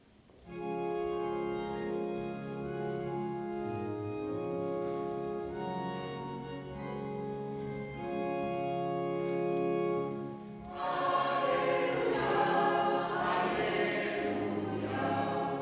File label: benedicat vobis messe de minuit